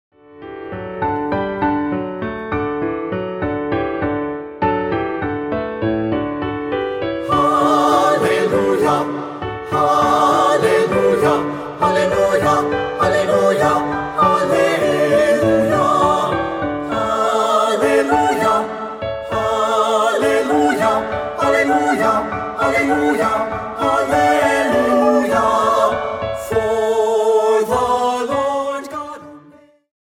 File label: Hallelujah Chorus